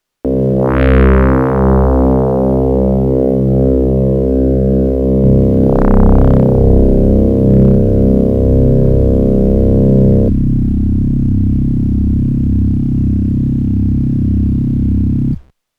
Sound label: YM3438 DAC